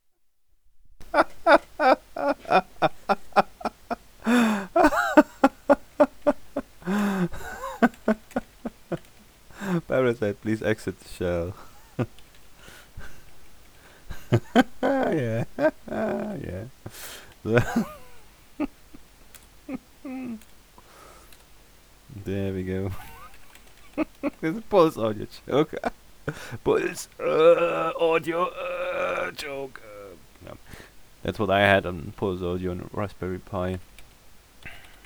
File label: haha